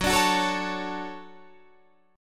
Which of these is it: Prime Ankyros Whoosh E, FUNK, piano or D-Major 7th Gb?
D-Major 7th Gb